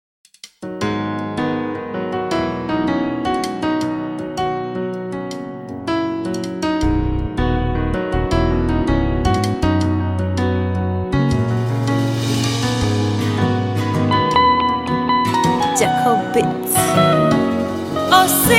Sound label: Osinze, Choir; Stream Of Love Choir